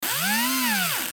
Mechanism Fast Rev, servos

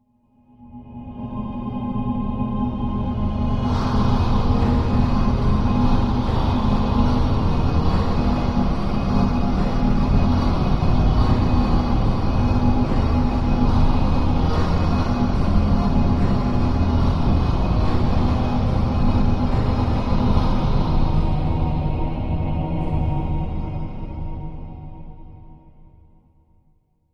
Music Eerie Machine, orchestral

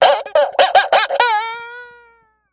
Boinging Elastic; boings

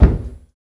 Tub Hammer Hit Dampe; hammers

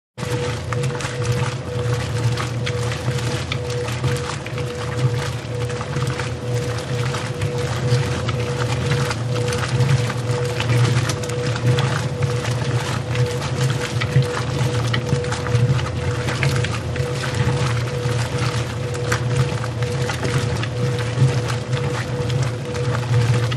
Wash Mach Wash Cycle, washing machine

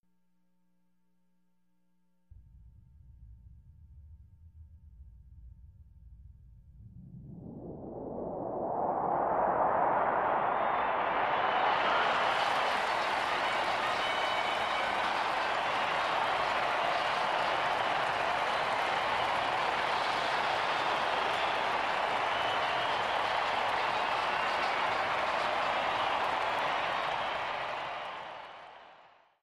Arena Crowd Cheer Up; sports arena

